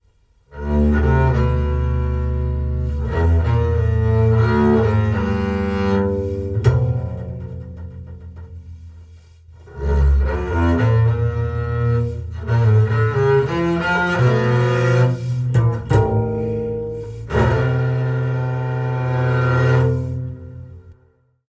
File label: Imitation William Tell Overture; Full Metal Daemon Muramasa